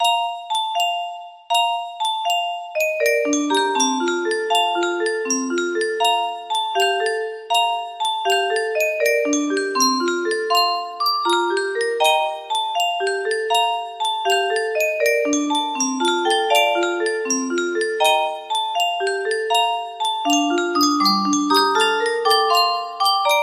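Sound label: with major chords bass, music box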